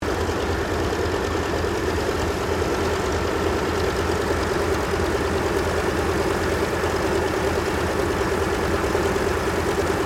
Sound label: AIRCRAFT PBY CATALINA PREFLIGHT, Catalina Flying Boat; 1942; Airplanes; Vehicles